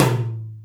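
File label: Hi Plate, Alesis D4 drum machine tom; 300 Drum Machines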